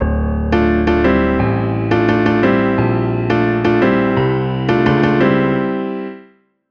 MUSICLP; neuro; Stanza

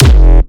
Phase Plant, kick

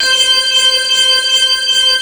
C5, Keyboards Old School; USB Soundscan vol.09; AKAI; BIG HAMM

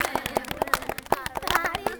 120 CLAPS

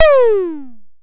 BDrum, Drumfire DF-500

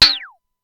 Simmons SDS-9 RM

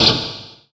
FX Dance

